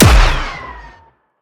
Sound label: Sciweap Shot Medium Gun Rifle Ripper